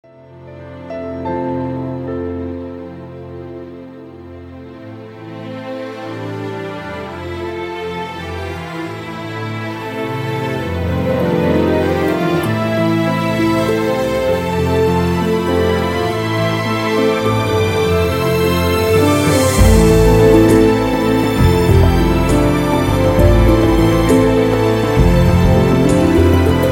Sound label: Piano string; colonne sonore